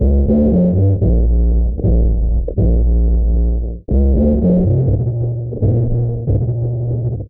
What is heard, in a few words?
bass, Loops Phonky